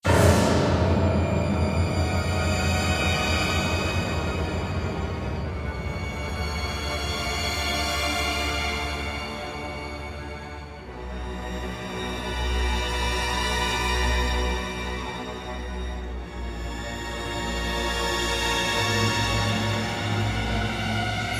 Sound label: Electric Chair; batman arkham origins